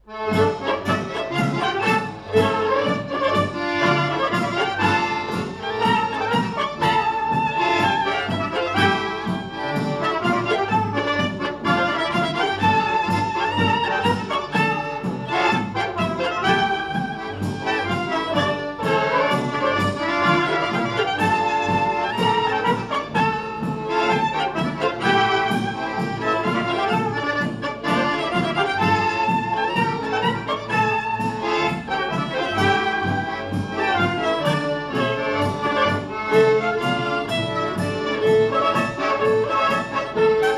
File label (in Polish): Krakowiak